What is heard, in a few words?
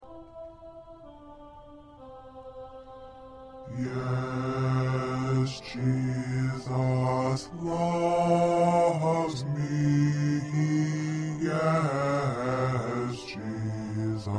Bass